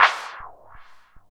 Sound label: MOD CLAP; East West; Phat & Phunky